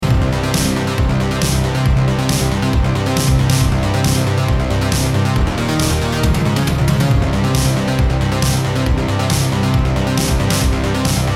Laser City Loop; neon boost

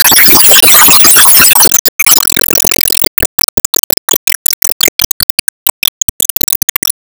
Enemy Ufoblock Active Loop

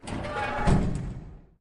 Close Mine Door; Lethal Company

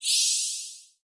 Reward Tokens Sparkles; subway surfers city sound effects; Android, iOS

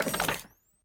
PickUp Electronics

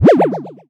Enemy Cactas Appear; Disney Star Smash; Android, iOS, Mobile

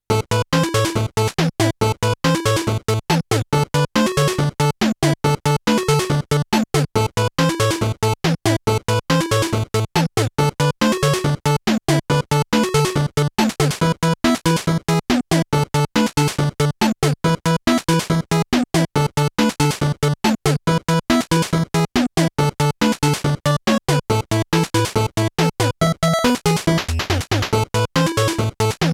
Shop Rock; Mega Man Legacy Collection 2

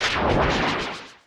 Enemy Golem Call Hand

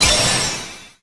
Tap; Disney Star Smash; Android, iOS, Mobile

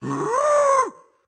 Dash Wind Up; Caffiend Caelan

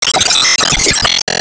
Smash Shot Gold

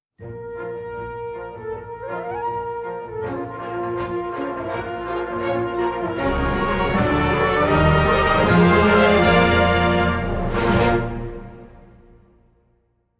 Resonance (Brass Variation); Symphonia Original Soundtrack 2024

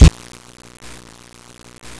Car world slow; Muscle Car 2: American Spirit

